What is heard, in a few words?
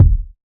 wet bass drum single shot c sharp key; kick